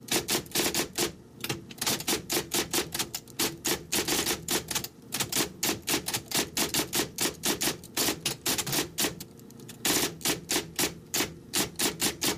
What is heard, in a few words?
Typing Computer Elec